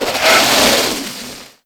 bmw bike skid; vehicle; mohaa

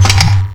power gun; cdogs

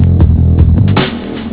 music loop; xash3d fwgs; sewer beta; background

